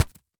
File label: impact plastic; fallguys